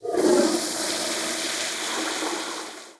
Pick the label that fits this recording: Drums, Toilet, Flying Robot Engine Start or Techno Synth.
Toilet